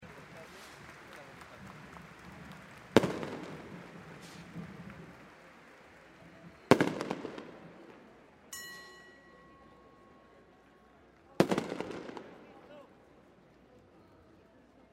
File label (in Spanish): SEÑOR DE LOS MILAGROS PROCESIÓN PETARDOS